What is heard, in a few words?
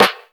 tight low end acoustic snare sample f key; snare drum samples